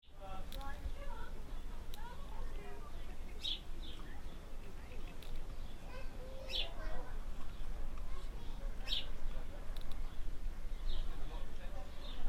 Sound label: prom near Neptune stationary, whitstable